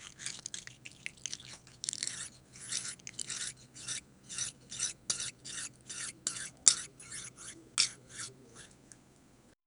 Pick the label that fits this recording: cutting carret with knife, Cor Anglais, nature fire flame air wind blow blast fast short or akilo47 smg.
cutting carret with knife